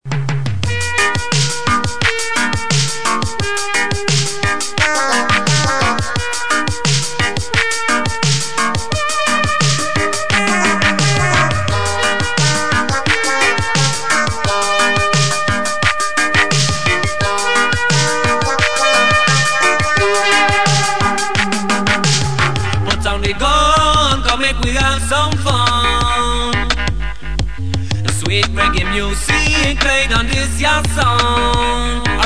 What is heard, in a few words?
sweet reggae music